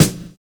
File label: SD, snare, 70 s Breakbeats